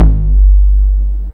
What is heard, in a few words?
KICK -R, Disco House; USB Soundscan vol.20 - Fresh Disco House I